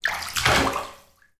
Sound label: household bath water splash shallow; sound effects one